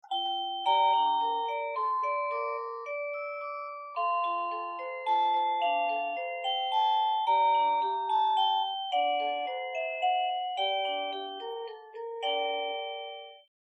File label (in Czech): melodie